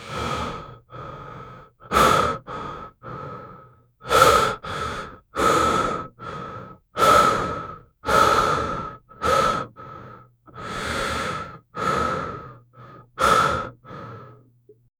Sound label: male heavy breathing; expression